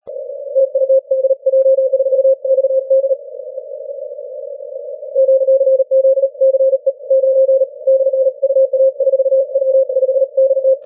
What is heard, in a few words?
40m cw